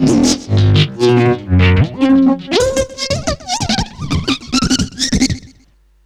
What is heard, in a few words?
fx drums; EZRollers